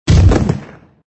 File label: Hard Fall; Extra Lives